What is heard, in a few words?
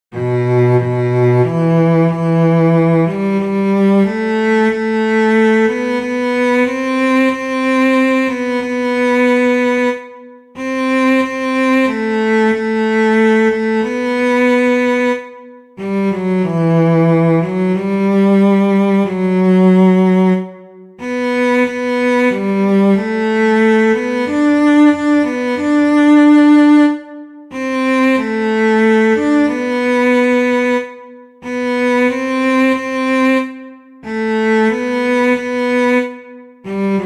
cello